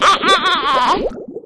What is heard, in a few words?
DROWN; openffa; babyboom